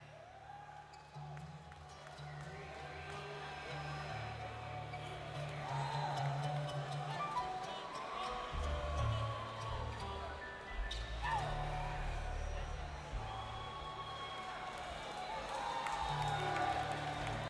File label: Crowd